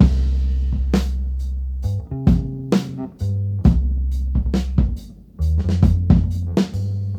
bass, Loops

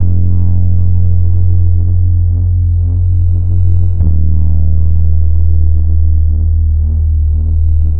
bass caddy, Loops, Sad

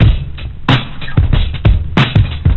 serge, Drum loops Boombap

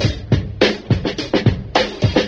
squeaky wheels, drum loops, funky

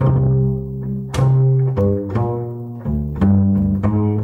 bass

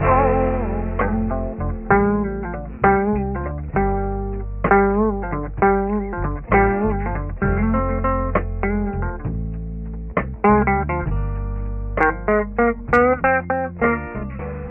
guitar, Loops, Phonky

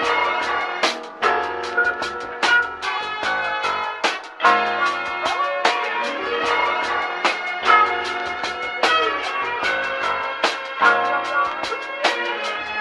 full, Loops Phonky

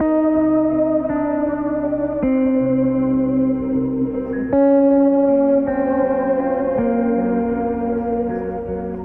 guitar, Sad

